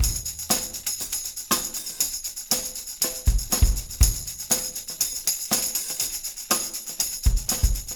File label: 120 tam